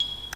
perc kamera Ab; drums; percs, foley; base pack

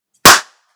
clap; base pack; drums

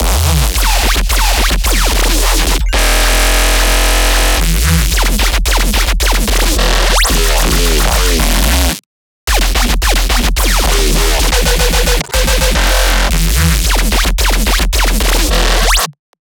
bassloop Laces E 110; base pack

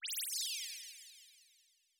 laser; bespoke - Floral v.1

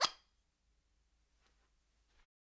percussion; bespoke - Floral v.1

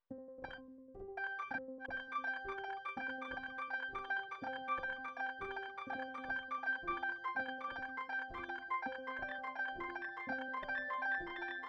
granular piano Cmaj; bespoke - Floral v.2

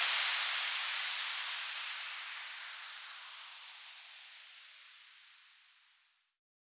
Exhausting; fx; base pack

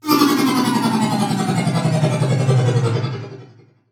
Sweep; SFX; 7rystan's 600+ FREE EDM SAMPLES & DRUMS

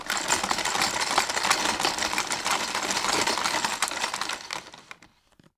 foley; bespoke - Floral v.1

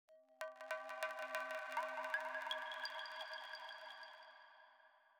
rain synth; fx; bespoke - Floral v.1

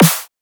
snare matt; drums; base pack

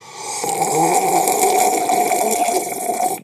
Slurp; 7rystan's 600+ FREE EDM SAMPLES & DRUMS; SFX; Foley

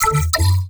synths oneshots; zakat; base pack

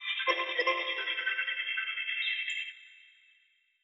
glitch F maj; bespoke - Floral v.1

